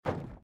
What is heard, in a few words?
SFX build wood